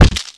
hit

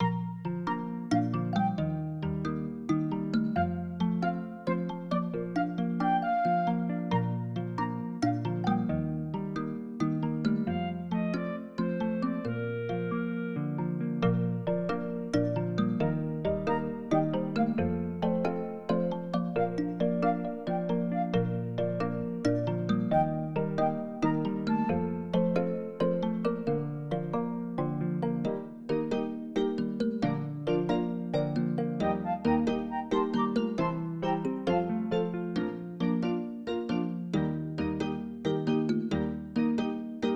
Credits Background Music; peppa pig world adventures

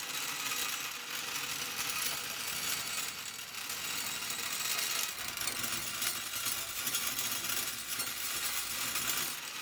metal slide loop; teardown ps5 windows xbox series xs gamerip 2022

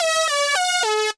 Beat

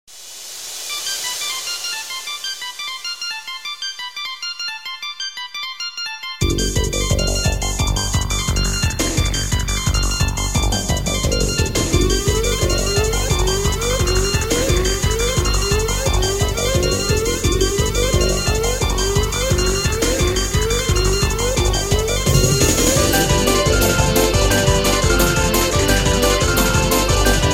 Techno; Techno De Chocobo II - 2Nd Remix

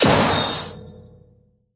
Cage bird Break; Teaparty

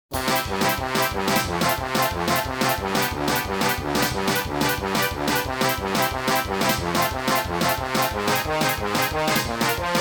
Comical Dungeon; Tales of Eternia

